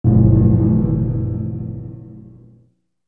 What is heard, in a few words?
bass